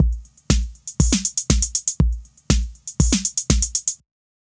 analog drum machine enhanced; modular effect board; sonic instruments

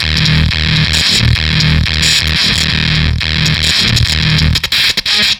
Fuzztest drum frenchtoast

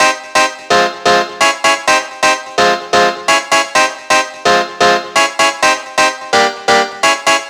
piano crystal; the producer school

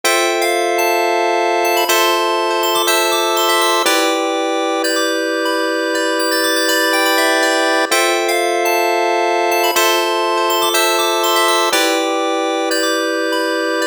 piano; fire by music sound software

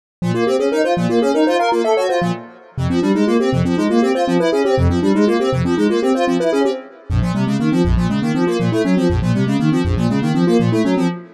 singing synth; the analog sound waves

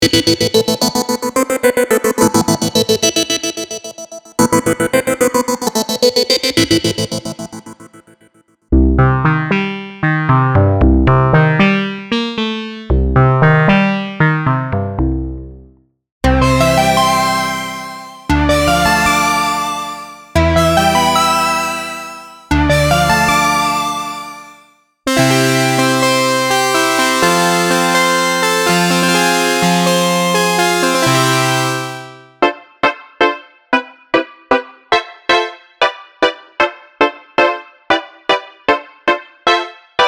keys; cherry audio yellowjacket

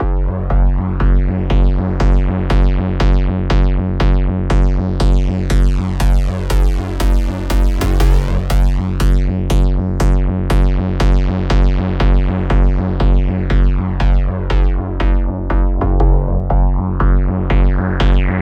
nice kicks compress osc2; firefly synth 2; sjoerdvankreel

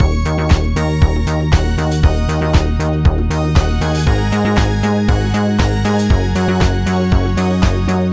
juno x bass; synth bass center; audiolounge soft

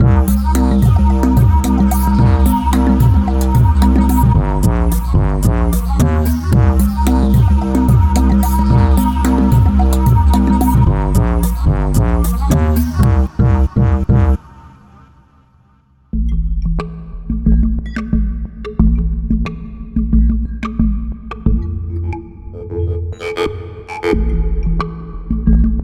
Urban Percussion Grooves; Alchemy

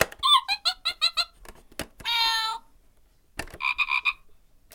clang; kirby kits

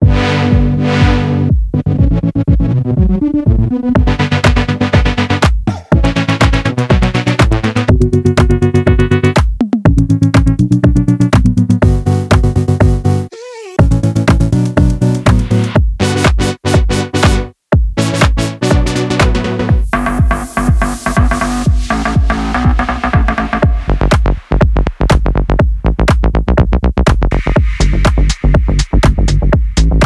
lofi house; sky; ultra audio

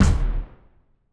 goal stomp; stomp machine; nova sound

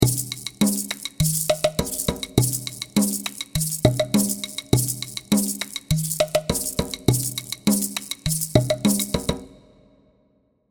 tiki percus; tiki percussion kit; tiki instruments; chiqui audio

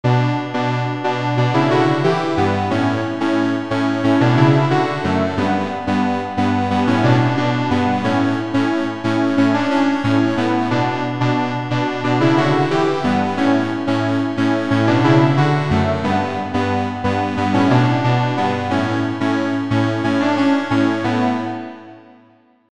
detuned supersaw

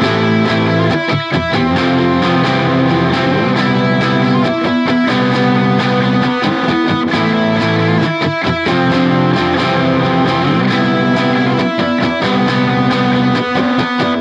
guitar riff plugin off; analog glow; tonalmark

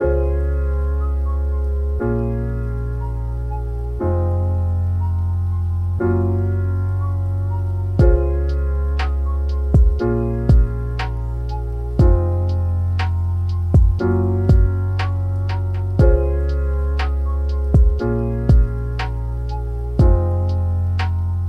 lo-fi pad; scratchpad; patent sounds

